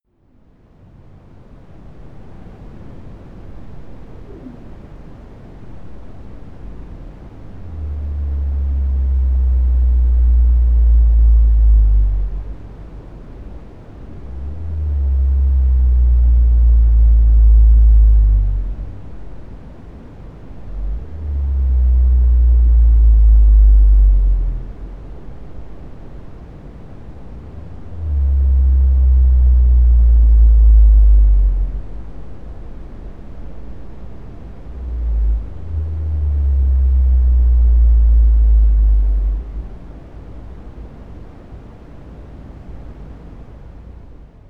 Blue Whale D Calls Audible Only With Appropriate Speakers